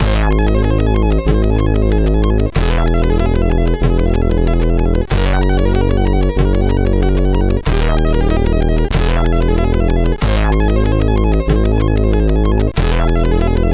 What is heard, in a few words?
Square, CSG8580R5